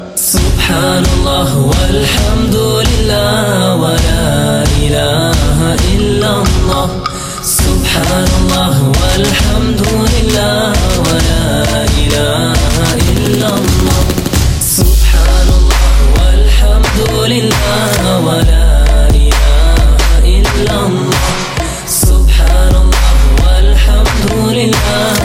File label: Arabic Trap